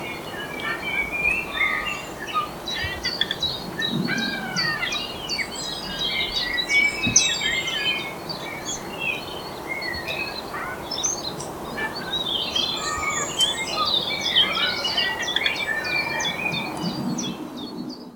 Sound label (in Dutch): gaai zang